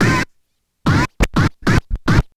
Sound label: Turntables